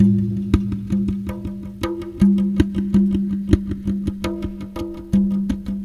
Semi Wrathful Squirrels 82, Percussion Combos, Abstract World Fusion; M-Audio; Pro Sessions VOL-38